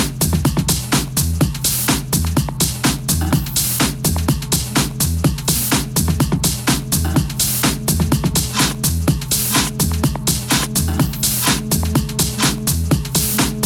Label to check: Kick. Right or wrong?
Right